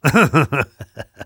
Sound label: laugh short